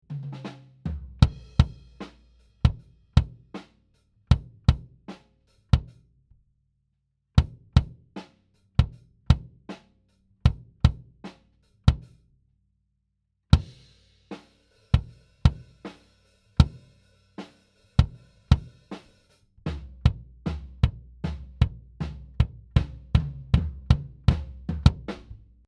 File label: Section C Kick